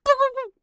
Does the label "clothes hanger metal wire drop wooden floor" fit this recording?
No